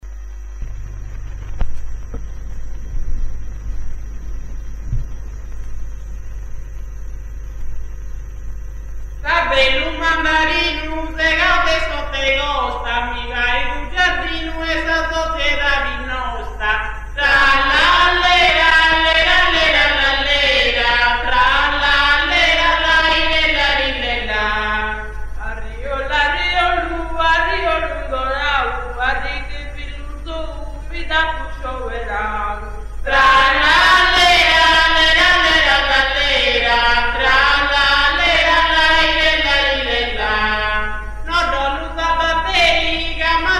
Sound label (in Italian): mutettus a trallalero, canti polivocali, Serramanna